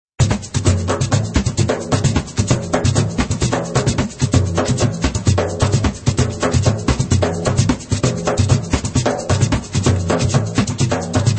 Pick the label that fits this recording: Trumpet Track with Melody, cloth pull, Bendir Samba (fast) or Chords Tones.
Bendir Samba (fast)